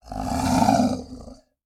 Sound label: Animal Mammal Carnivore Tiger Hiss; animals; AdobeCC